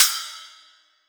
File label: Splash; Roland SPD-S